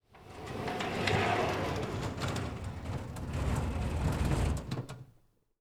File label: Shutter Door; 99Sounds; The Warehouse